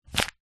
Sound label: money 5 dollar note grab snatch; foley; zapsplat